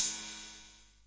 Korg KR-55 cym; drum machines

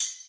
tambourine

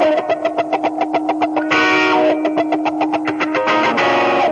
wah guitar; guitar loops

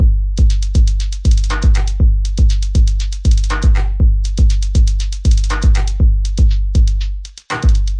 120 bpm, boom boom clock, drum loops and breaks

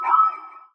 Fracture Perc, Found Percussion Hits; UNUSUAL KITS and FX; ModeAudio

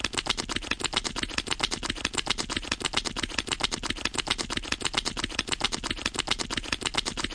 vintage slither effect, Cartoon Sound Effects; UNUSUAL KITS and FX

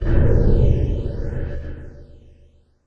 dream echo; Various Strange Sounds and Effects

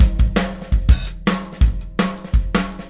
165 natural breakbeat; 161 to 180 bpm

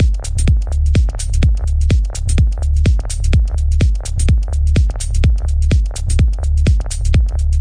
126 bpm deep house crunchy

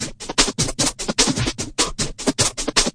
162, drum loops and breaks; 161 to 180 bpm; gatorade

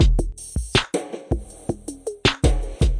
080 bpm lynchpin percussion popper

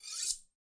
Knife

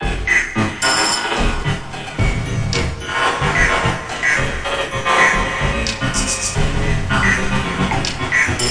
110 banshee IDM, SYNTH AND ELECTRONIC LOOPS

